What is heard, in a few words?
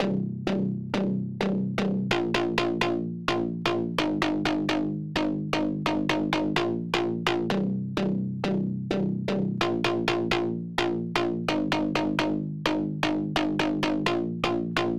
128 BPM C Min Bass; House; Loop Stems; Cymatics; Breakdown Loops; Golden Hour